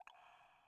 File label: water drip; Rust